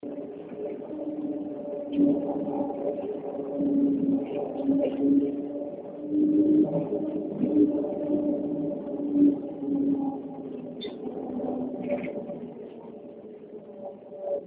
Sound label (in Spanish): tranvia